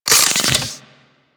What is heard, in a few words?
Sidearm Reload, Weapons; Warframe; Tenno; Wraith Sidearm